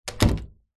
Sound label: household door office close small room; zapsplat